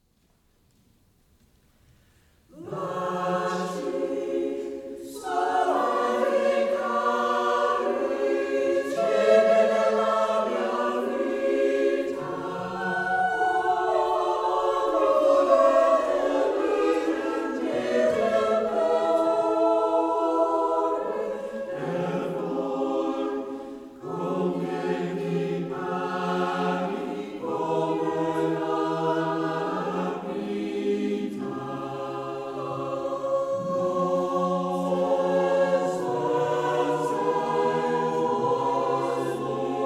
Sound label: Choir Singing; Baci Soavi E Cari